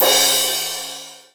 RIDE, MONO RIDE CYMBAL; ADZRIDE

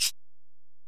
Ac Perc, Drum Machines Alesis DM-PRO

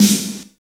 snare NO ATT, Roland; Roland L-CD701; Snares 6; Sn Modules 6

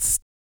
VOC PERC, Vocal Planet Jazz FX BASS PERC

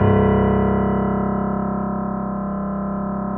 C 0, Keyboards Acoustic, GRANDP ST; USB Soundscan vol.17

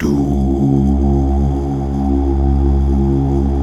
Mal Duh; Best Service Gigapack 1

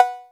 CowB An, percussion

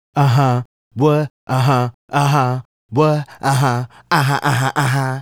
Male Solo, Hip-Hop and RnB Vocals

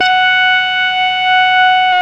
SYN MORP, Roland

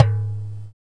Dumbek; Roland LCDP14 Africa VOL-2; Af.Hand Drm2; N.Afr.Dumbek

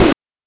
BANK2 SFX, NES DPCM; MIDINES

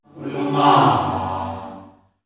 UNA MAAH, CHOIRS; Spectrasonics; Heart of Africa

